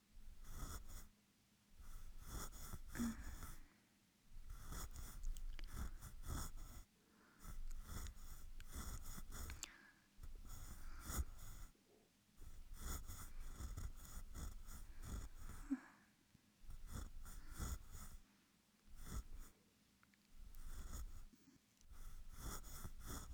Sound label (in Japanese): 耳かきエンドレス右, ASMR